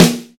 dirty steel snare drum b key, steel snare drum sample tuned to the B note; snare drum samples